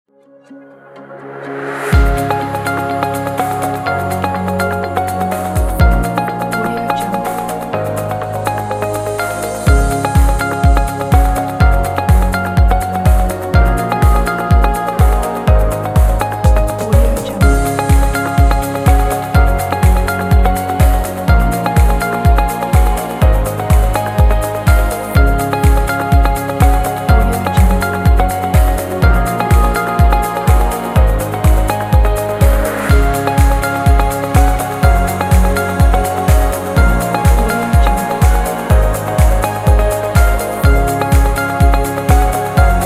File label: upbeat corporate